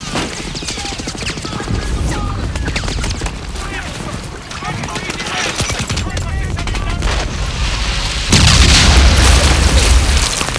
fight, ambience; dod

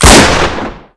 revolver fire; svencoop

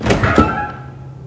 door move; svencoop; aomsounds

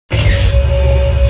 door move; svencoop

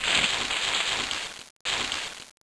bush; aompa

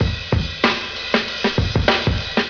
beat; svencoop; votemap

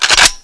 FG42 Bolt pull; dod

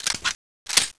fiveseven clip in, weapons; svencoop

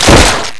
spit, tarantula; svencoop

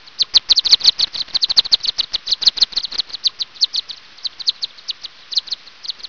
bats

